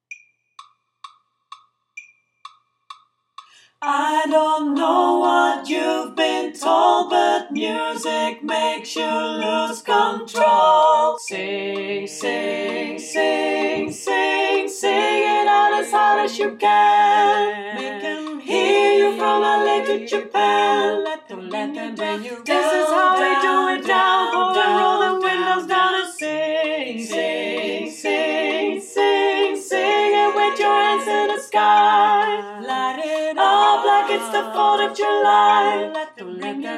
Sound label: Sing chorus sopraan